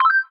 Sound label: add battery; ohms law